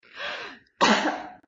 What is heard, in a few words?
Cough; Lewd SFX